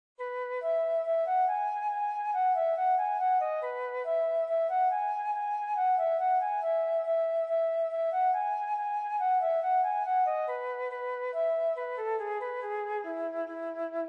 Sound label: Flute Solo